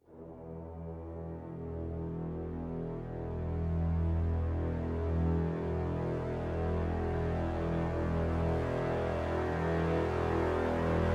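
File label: crescendo brass swell lo E; brand x music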